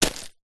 footstep single boot deep small granite stones dirt dust; zapsplat; foley